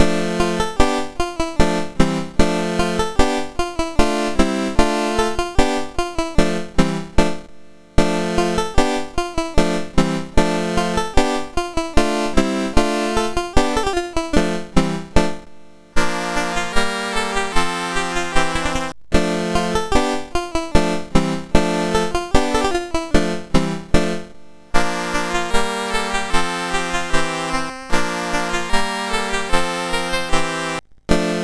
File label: MOS6581R2